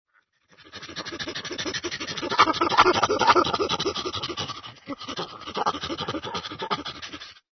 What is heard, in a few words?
ANIMAL CHIMPANZEE